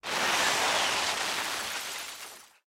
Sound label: aa explosion debris; War Thunder